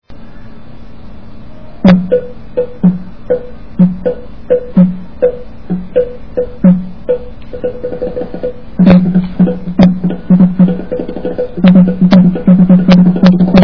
naqaara; Etnologia

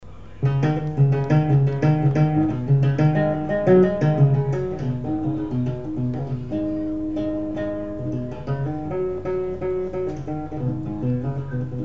inanga, Africa musica; Etnologia

